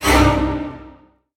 big win lines; Hungry Shark; Wazdan

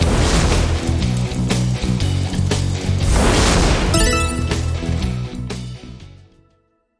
bigwin; Wazdan

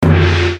bonus chance; Dragons Pearl

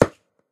reel stop; games; Fenix Play 27 Deluxe; Wazdan

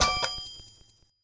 increment stop; Racetrack Riches; megaboard

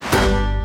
freespin wild; Wild Guns

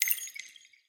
gambler level up; Great Book Of Magic Deluxe; Wazdan

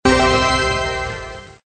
CHEST WIN; Sharky

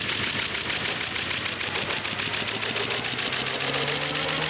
long spin; In The Forest; Wazdan

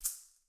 line toggle

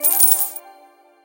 collect money; games; Corrida Romance; Wazdan

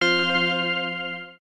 autoplay off; Book Of Queen

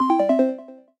autoplay off; Mighty Rex

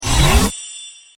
UI card; Paradise

